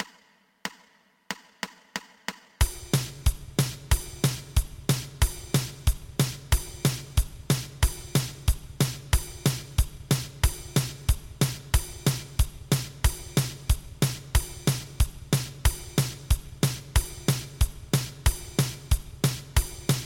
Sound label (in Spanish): 2 pulsos